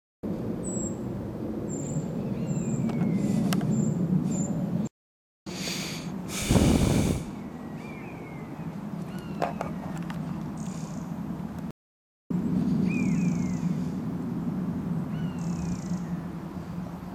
honey-buzzard buzzard honey buzzard juv female anx calls; hexham w